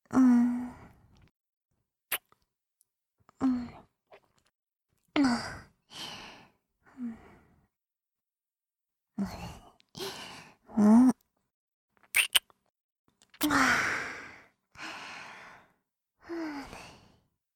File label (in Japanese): おはようのキス, ASMR; 必然的セックス欲求